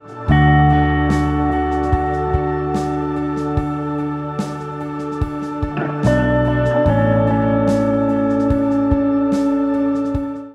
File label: Church Melody; church melodies volume 1